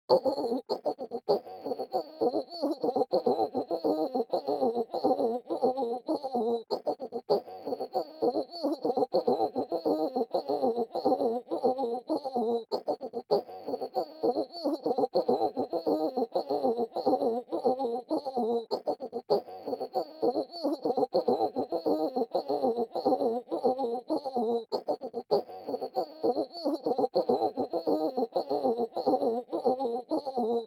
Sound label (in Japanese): リズムオホ声; ミオ; 双子ダウナーメイドにオホ声で支配される残酷なオナニーサポート